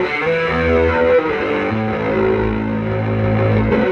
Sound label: Fierce, Electric Guitar; Sonic Foundry (Sony Creative Software); Friendly Fire; Wrath